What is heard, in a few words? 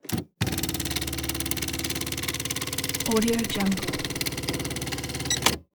headmovement spacebar holddown; soundbits-soundfx